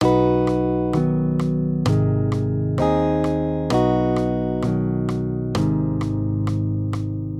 maj m3, Major Triads, Root Movement Three Semitones, Guitar, Accompaniment; B-Ab